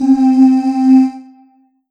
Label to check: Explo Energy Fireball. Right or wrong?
Wrong